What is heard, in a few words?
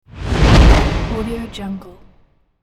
large flare by